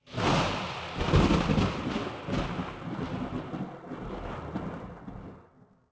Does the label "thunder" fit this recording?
Yes